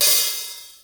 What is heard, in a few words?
HAT, Hip Hop